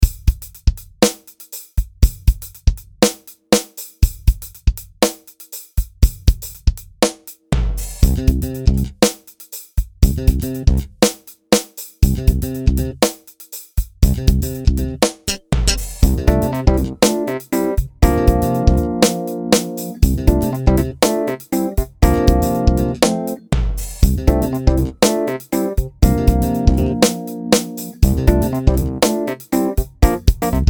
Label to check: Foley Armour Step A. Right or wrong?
Wrong